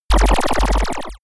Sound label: pulsing laser gun; soundpool